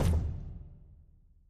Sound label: reel stop; Aztec Gold Megaways